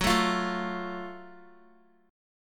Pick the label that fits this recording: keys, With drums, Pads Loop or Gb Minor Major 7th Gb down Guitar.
Gb Minor Major 7th Gb down Guitar